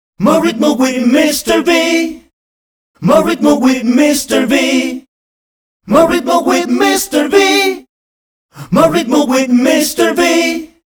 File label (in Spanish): jingle voces